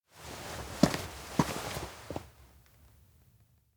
steps indoor workshop stand; anemoi パッケージ版 1.1

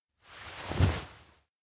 fall drop; awayuki; anemoi

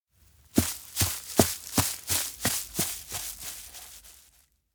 steps outdoor old ranch walk away; spica; anemoi

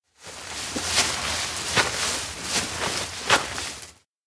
bag box cross grassland; anemoi

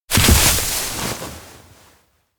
hit tree; anemoi

